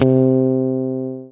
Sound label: Medium Bass Pluck